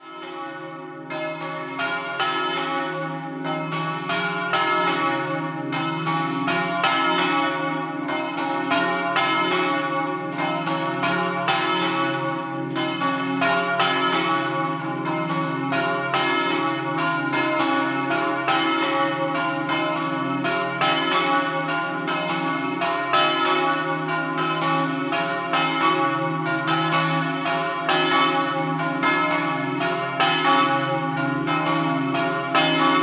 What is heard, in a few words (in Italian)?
Doppio, campane; Beano